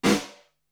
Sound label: AMBIENCE, ROOMS